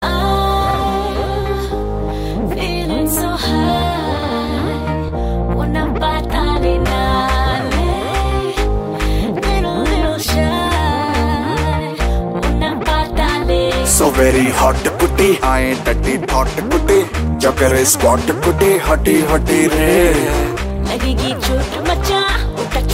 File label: Duet Male Female